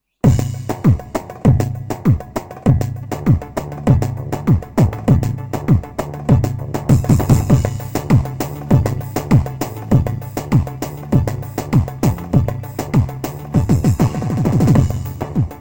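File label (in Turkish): tr pop halay, korg pa 500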